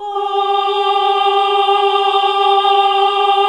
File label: "Ohh" G#2, classic vox